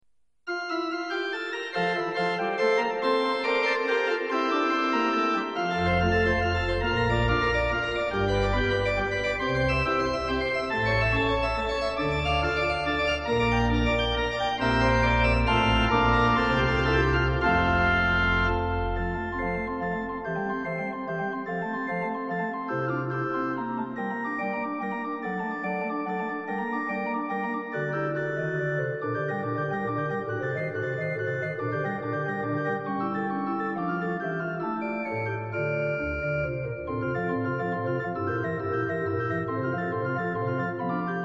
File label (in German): Praludium F Dur; BWV 556; Bach